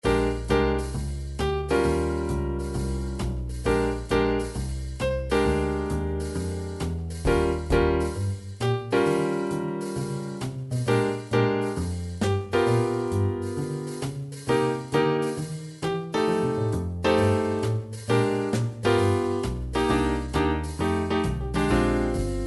jazz B flat blues, midi

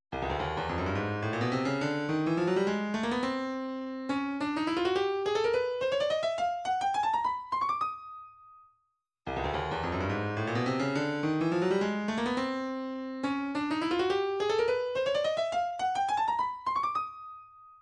105 spoons, midi

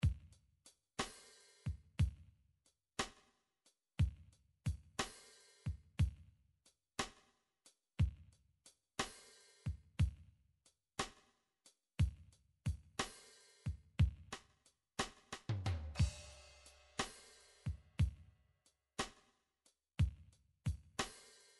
drum midi files blues

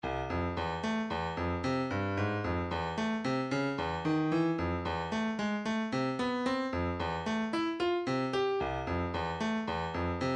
112 hihat

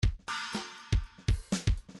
grooves funk; Midi File

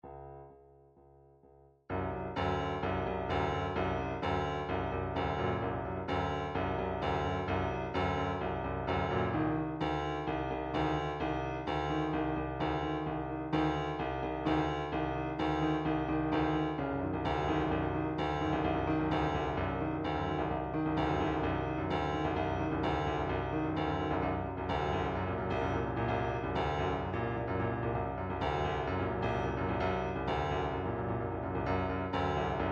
dance pop

